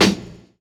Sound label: Snr